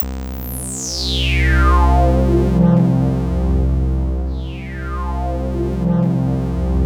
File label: C2, Synth Line; 90 s Sample CDs; NiceBeats; SynthLine VOL-2